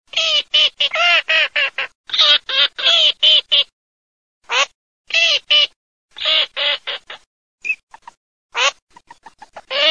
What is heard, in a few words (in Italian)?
alzavola alzavola, birdsound